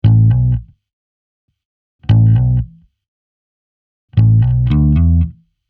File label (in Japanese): ハンマリング